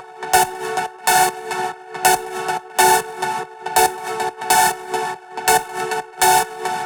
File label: Lectrotrance Melody 3, 140BPM; Vengeance Samples